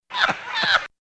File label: pterodactyl laugh; shorter